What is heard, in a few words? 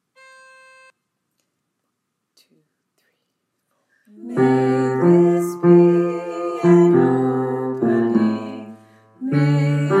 Bass, choir; freedom choir